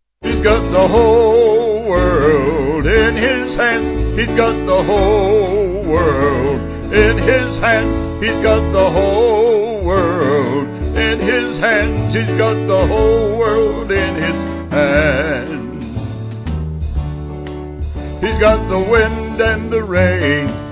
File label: Vocals Band; Every time I feel the Spirit; Sung Lyrics